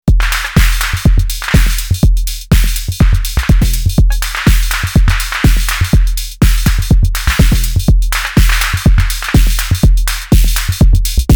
Arturia DrumBrute Impact Pattern

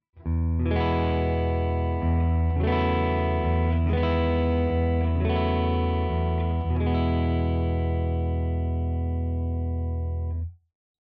clean strum all positions; Schecter Exotic Ziricote

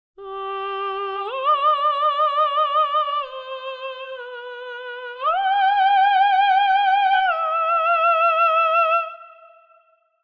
Voices Of Opera Sopran Legato

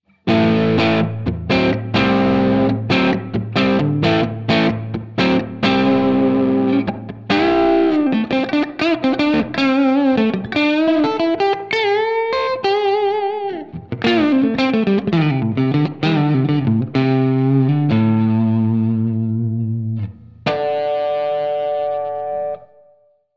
ESP SC-20 Neck PU low gain

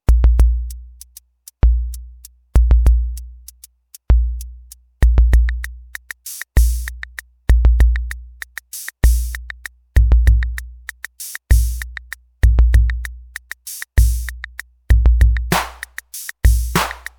MFB Tanzbär Lite Hip Hop

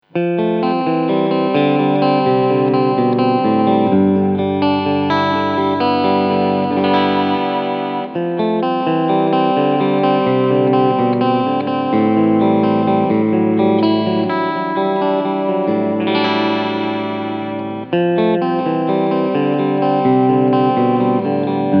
Clean Position

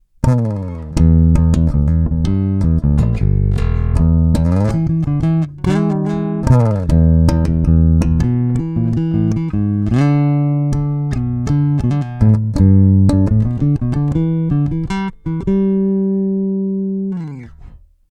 Neck active neutral

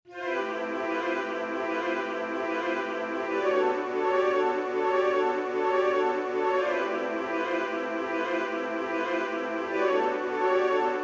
woodwind ensemble arpeggio flutes

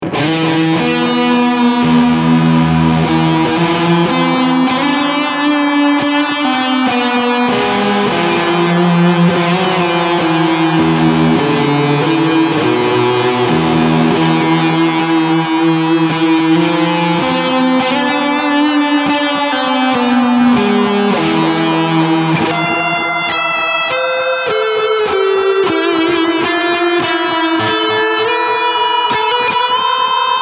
Echo Dream 2; Death by Audio

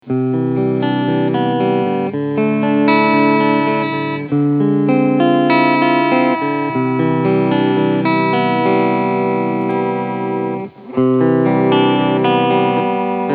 Cleansounds 1-3

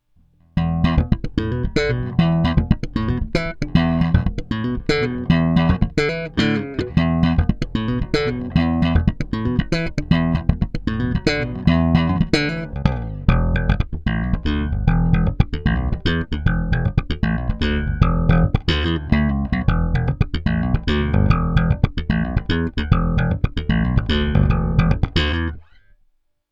Treble boost

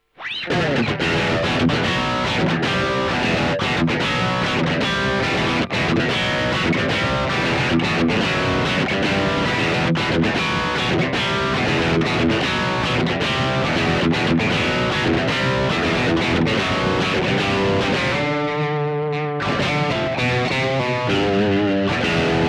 Blackstar LT Echo 15

